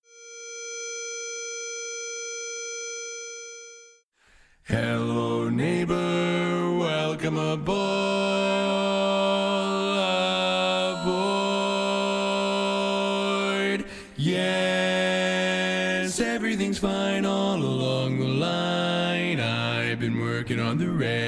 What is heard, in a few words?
Bass, I've Been Working On The Railroad; Polecat II